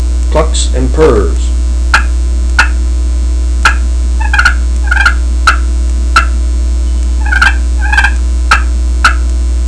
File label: copper clucks purrs; Southland Sounds